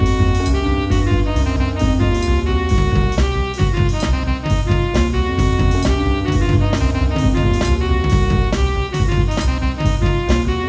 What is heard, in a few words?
loop mixdown